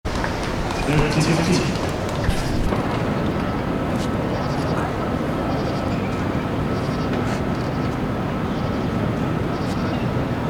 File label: night time tennis; Late night tennis match, North Carolina, USA in July 2024; I think it started just before 8 PM; Here I'm at a late night tennis match